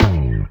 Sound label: Single Acoustic Basses; Best Service; Dance Floor Bass